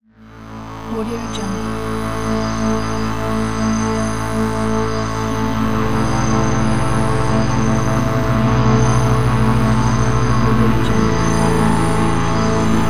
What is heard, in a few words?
atmosphere drone